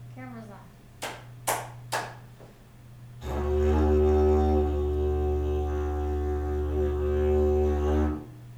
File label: digifu room